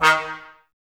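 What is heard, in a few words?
brass trombones mute; Miroslav Vitous - Woodwind Brass Ensemble; Tbs Mute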